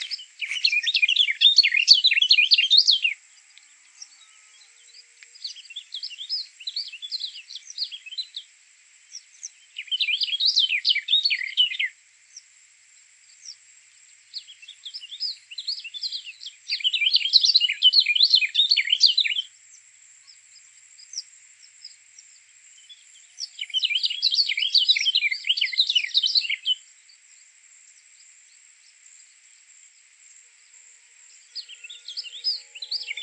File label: Swamp Grass Babbler Laticilla cinerascens, swamp grass babbler call